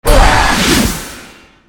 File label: Nightmare Skill